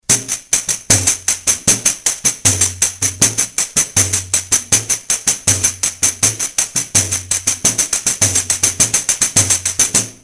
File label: pandeiro; lateinamerika